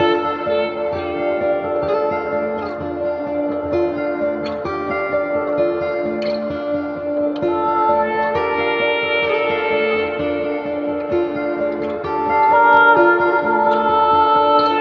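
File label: guitar and voice; Sad; Loops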